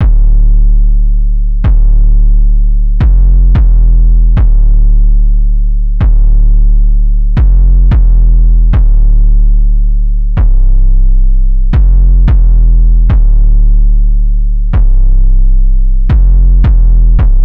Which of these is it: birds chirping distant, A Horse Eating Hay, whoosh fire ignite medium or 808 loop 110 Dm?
808 loop 110 Dm